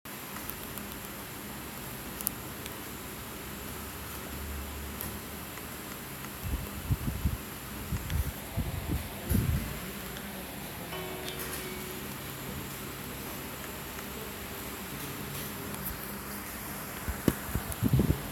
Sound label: karaoke recording